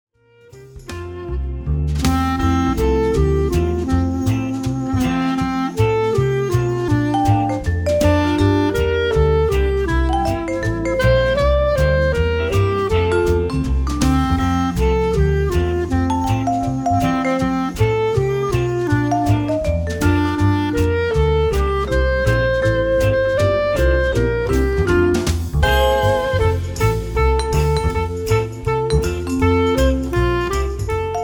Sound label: Jingle Bells Klar